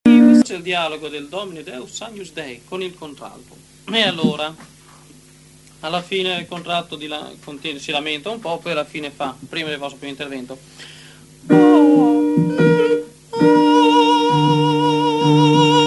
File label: Domine Deus Agnus Dei Tenori; Vivaldi Gloria